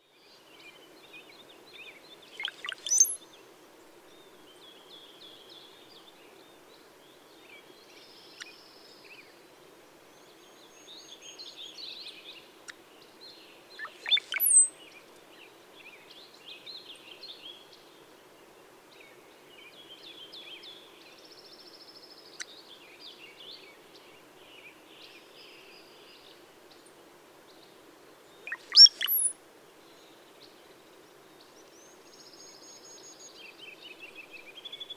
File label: Brown-headed Cowbird